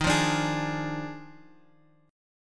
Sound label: D sharp Major 7th Suspended 2nd Suspended 4th, Guitar, Open G; 13,10,12,13,x,x; chordshape